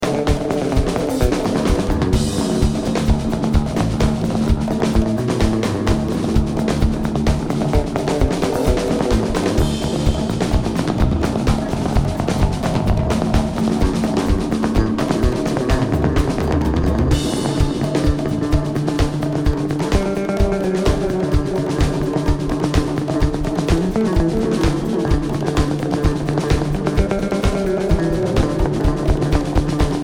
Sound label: Drums and Bass